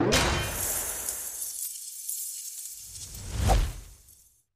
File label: big Win Anim; jack and beanstalk